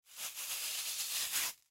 Cleaning Brush, Cleaning Brush Scrape Tile Bathroom; Household